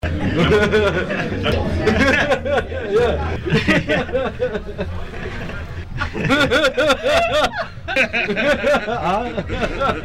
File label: laughs